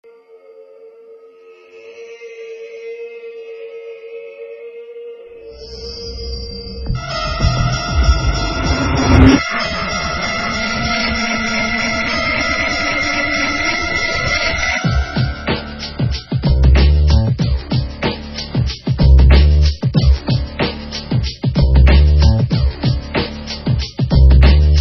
lose music; deathlegends

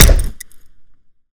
wrench hit; weapons; melee

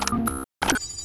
ar2 reload, weapons

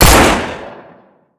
demolisher fire; deathlegends

